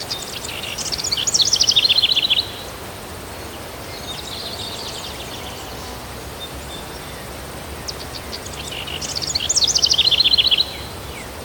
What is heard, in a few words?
Birds Loop; kult